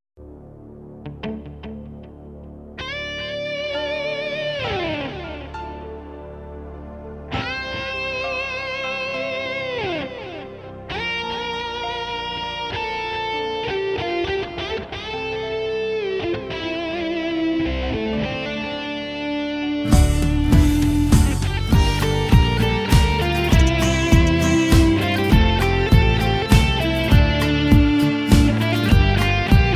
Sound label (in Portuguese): solos de guitarra